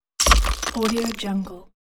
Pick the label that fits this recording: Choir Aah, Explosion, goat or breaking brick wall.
breaking brick wall